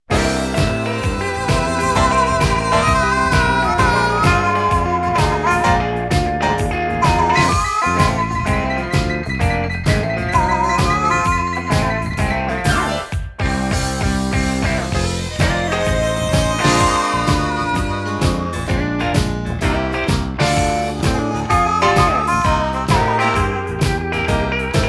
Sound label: BLUES; Cascom